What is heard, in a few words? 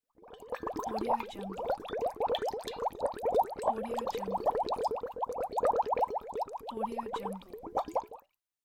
water gurgling